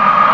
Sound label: wind; sc88pro; direct sound samples